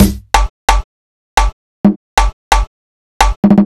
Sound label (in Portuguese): Beat